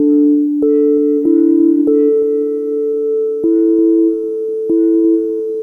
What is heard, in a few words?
Ghost Bowl; Sonic Foundry (Sony Creative Software); Loop Noir